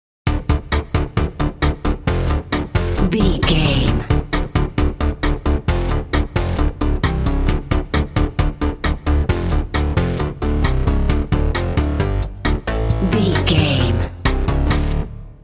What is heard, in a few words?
character selection screen video game bumper